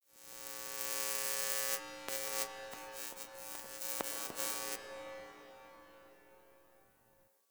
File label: Fluorescent Light Flickering One Off; Warframe; Ambience; Entrati; Dante Unbound Leverian